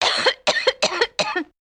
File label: COUGH WOMAN; SIMUZENSOUND